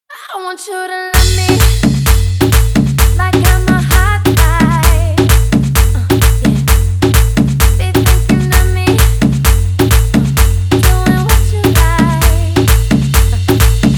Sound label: intro outro 130 bpm